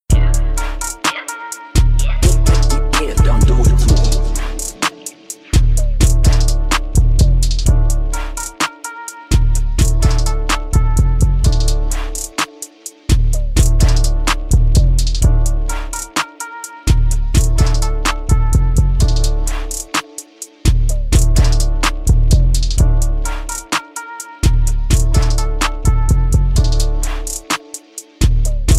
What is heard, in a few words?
Instrumental